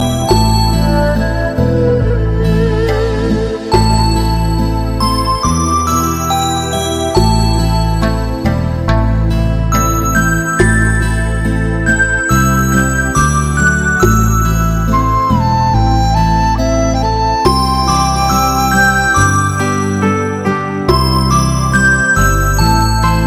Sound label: sound of buddha